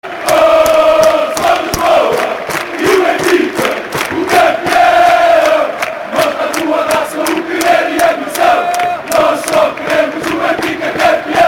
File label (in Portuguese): Benfica Campeao